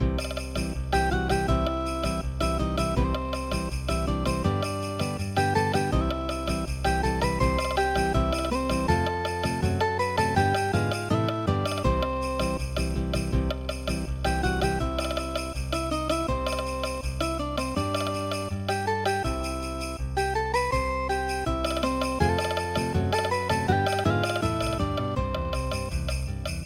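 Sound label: flamenco, midi; kuma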